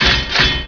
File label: armor, items; quakemapping